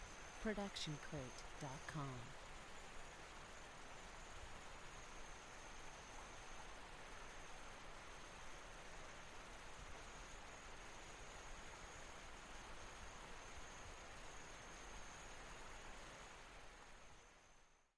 loopable rainforest ambient with distant stream; soundscrate

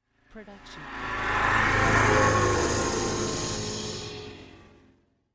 slow fly by

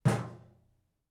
Metal Footfall; soundscrate

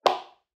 footfall heel; soundscrate

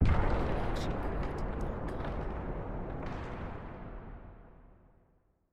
Nuclear Explosion With Debris; SoundsCrate

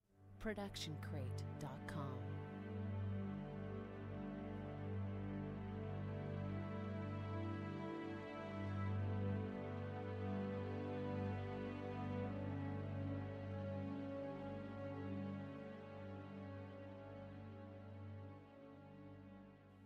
feel good strings phrase; soundscrate

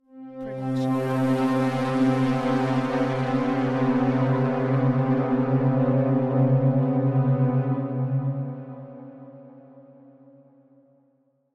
analogue dissonant swell

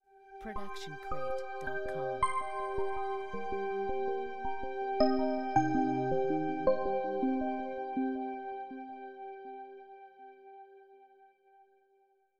Quirky Sci-Fi Ident; SoundsCrate

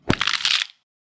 bone breaking impact

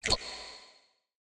water swell; soundscrate

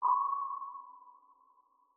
pin drop ambient; soundscrate

